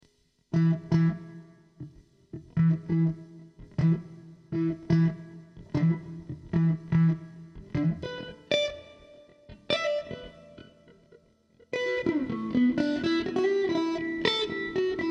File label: Em 120 funky groove